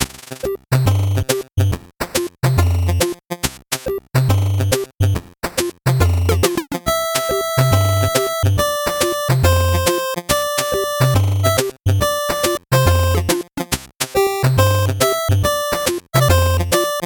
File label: 8bit bgm slow